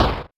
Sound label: Small Beam Blast; Samus; Super Smash Bros